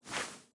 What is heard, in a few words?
Sfx Darts